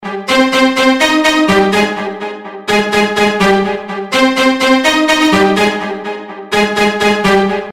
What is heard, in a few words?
Deluxe Strings Sms